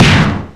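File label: Rivet Hit Exterior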